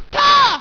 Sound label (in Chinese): 攻擊; 夏洛特聲音資料庫; 侍魂